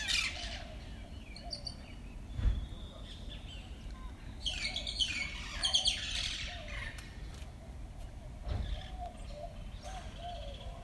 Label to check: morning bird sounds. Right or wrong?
Right